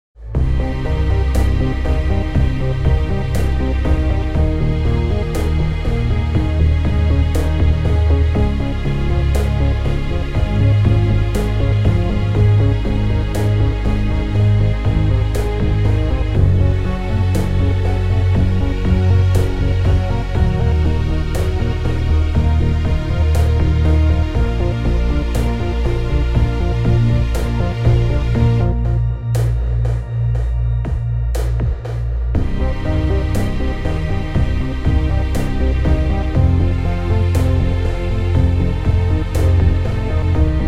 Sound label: Ambient